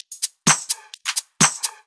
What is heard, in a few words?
Electro Loop 128 BPM; Vengeance Samples